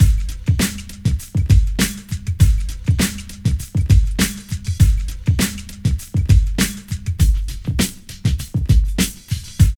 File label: PHAT LOOP; E-Lab; X Static Goldmine 2